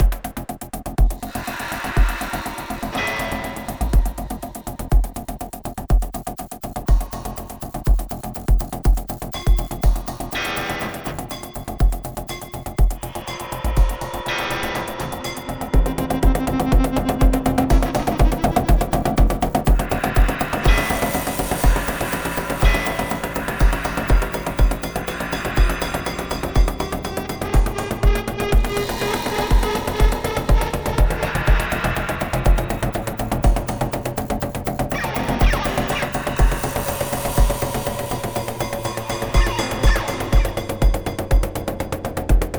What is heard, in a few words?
pulse